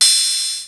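cymbal synth, Drum Machines, Yamaha Motif ES-Series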